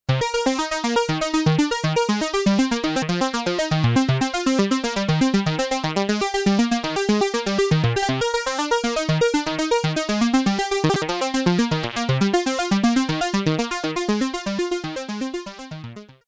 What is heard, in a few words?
Kobol Env Mod no patchcords